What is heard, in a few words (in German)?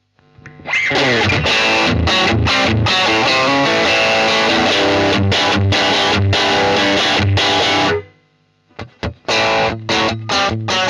Rock Potivariation Strat; JCM-1